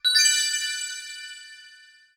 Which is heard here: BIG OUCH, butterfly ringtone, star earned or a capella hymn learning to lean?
star earned